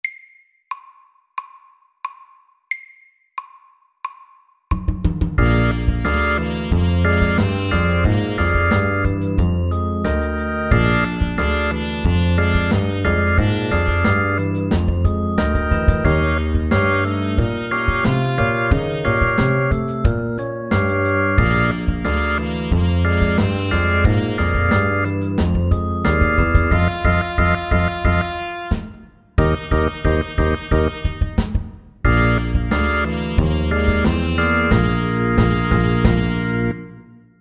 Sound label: 12 bar blues SLOW 90